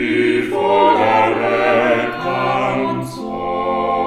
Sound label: quartet